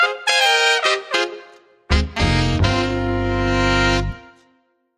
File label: The Bebop Sting